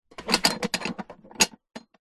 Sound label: foley box container industrial metal small lock hinge